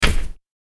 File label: Thud Old; blindside